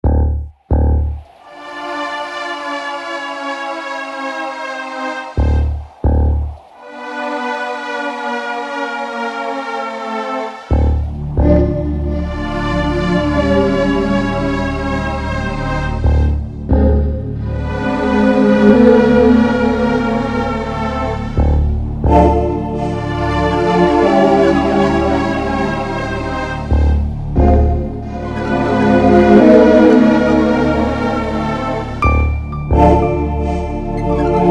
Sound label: classic Death; Siralim Ultimate